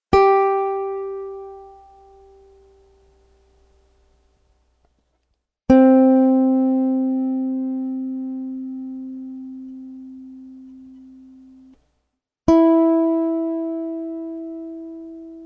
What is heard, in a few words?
tuning track